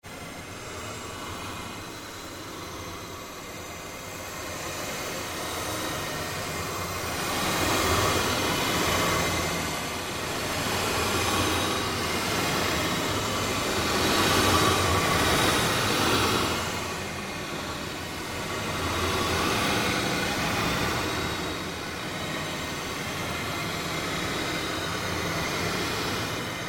Created Ambience Fluctuation, Rise And Dive Sound Effects; Sound effects created by manipulating other sounds; Rises and Dives